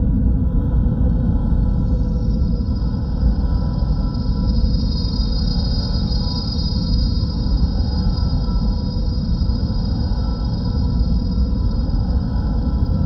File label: SHIP LOOP; AudioSpeed